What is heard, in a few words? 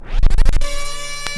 SYNTH SCRAT, Vinyl Scratches